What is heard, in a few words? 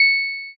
pon